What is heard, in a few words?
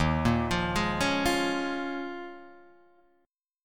Eb 7th Suspended 2nd Suspended 4th Eb, Guitar Drop D